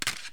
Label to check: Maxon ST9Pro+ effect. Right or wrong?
Wrong